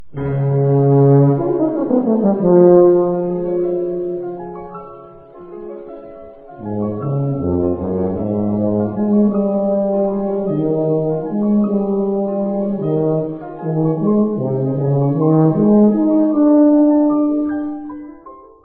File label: tuba; INSTRUMENTOS MUSICAIS